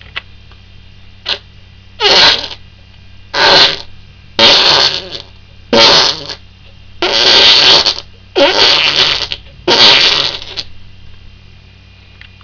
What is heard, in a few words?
fart